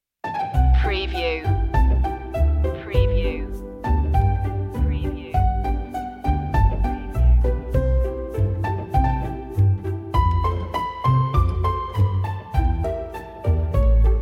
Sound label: cookie jar no string pads